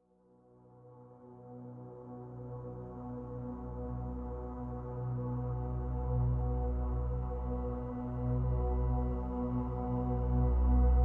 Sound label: pads only